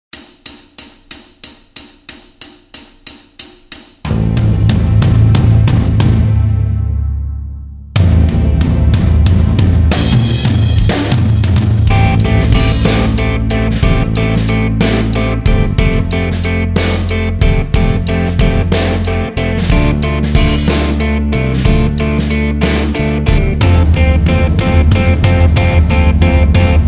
backing

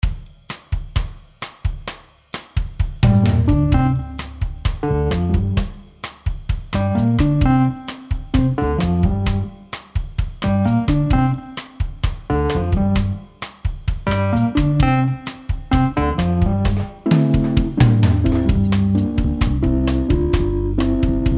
backing, guitar

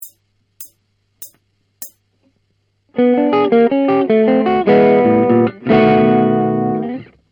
blues

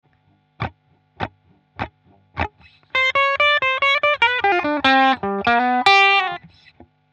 blues, guitar

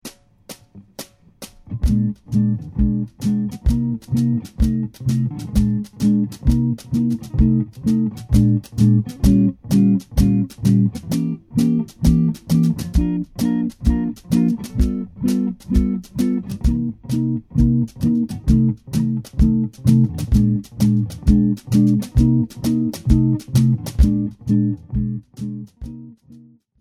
blues

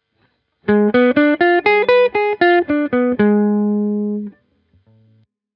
blues, guitar

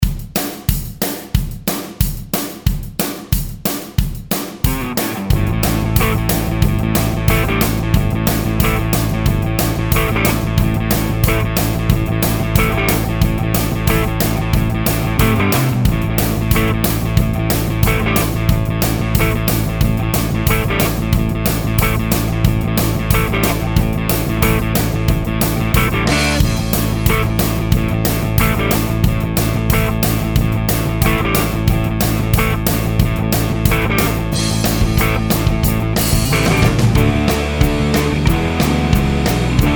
backing, guitar

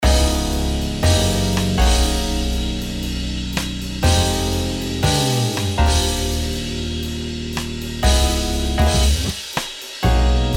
improvising backing, guitar

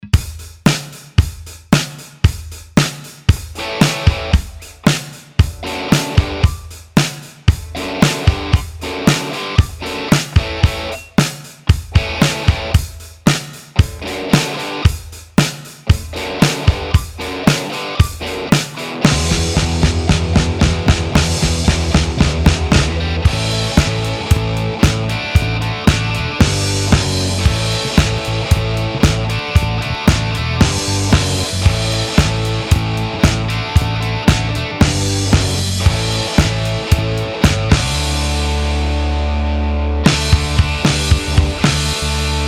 backing

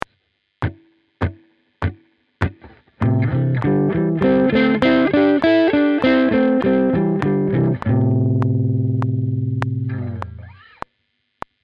blues, guitar

